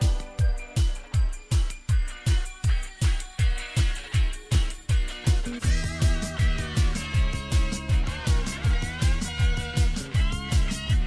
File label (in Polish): rock3, Rock / Metal